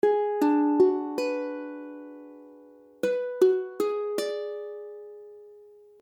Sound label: Abm7b5, akordy ukulele